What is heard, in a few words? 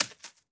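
bullet case bounce 556 grass; gmod; viper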